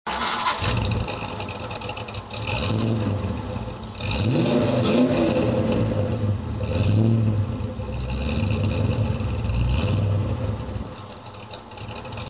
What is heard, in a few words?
more zorst, v8 mini